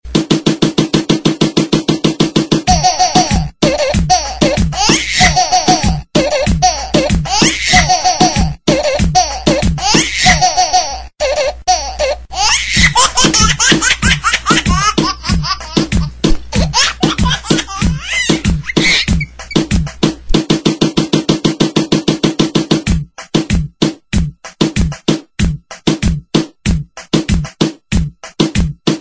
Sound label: Baby Remixx